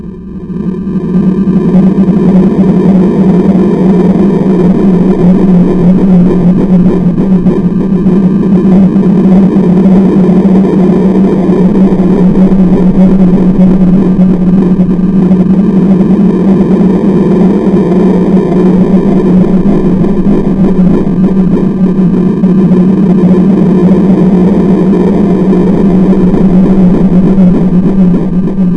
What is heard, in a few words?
ufo; yuris ufo